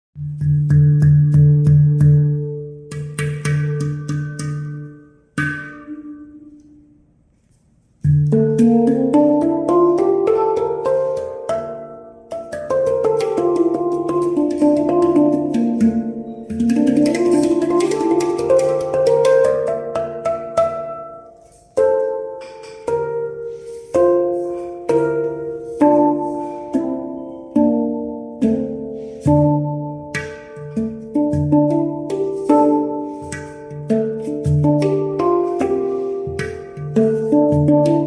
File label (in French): Handpan 13 Notes Re Mineur; CosmoPan; BlueOrigins